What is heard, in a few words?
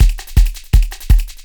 LOOP, Eurotech; Sounds Good